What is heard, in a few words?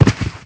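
orc warrior die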